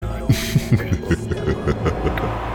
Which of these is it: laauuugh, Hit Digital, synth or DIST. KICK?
laauuugh